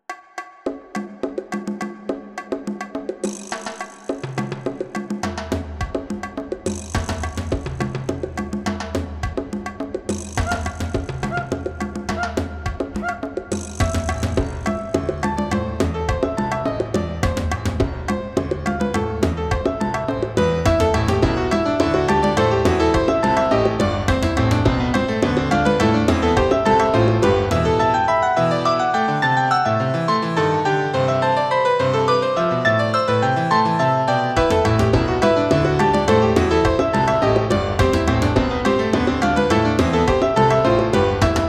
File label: pieces for piano percussion